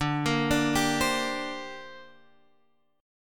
D 7th Suspended 4th Sharp 5th down Guitar Standard; D7sus4#5 arpeggiated